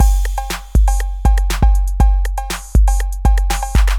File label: RYTH